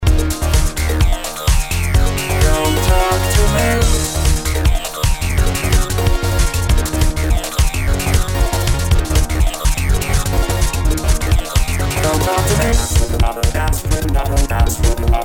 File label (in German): Pitch mit